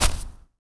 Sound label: pl dirt, player; svencoop; arctic incident